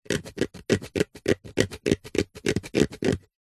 foley wood long branch pole scratch fingernail

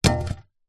industrial shovel metal drop or set down grass

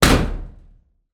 impact metal object strike metal garage wall

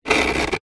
plastic item scrape concrete; zapsplat; foley

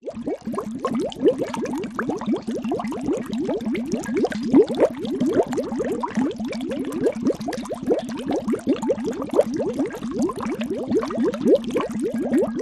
horror cauldron bubbling; zapsplat

